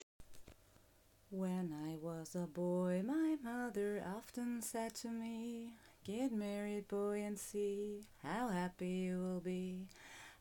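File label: Female, in Style of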